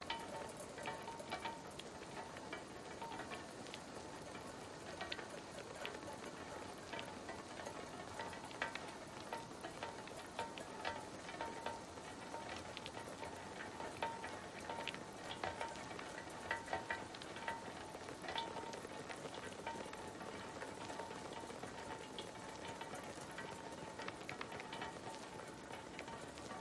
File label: rain airy windy muffled drippy; PMSFX